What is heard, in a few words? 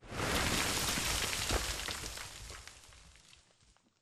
Explosion Rumble Exterior Dist Debris; Boomtown; Titanfall 2